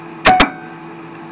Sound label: click